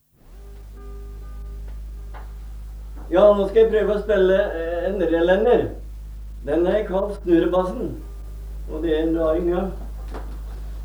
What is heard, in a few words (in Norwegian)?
Snurrebassen Reinlender